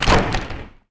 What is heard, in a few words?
impact crate varied; impacts explos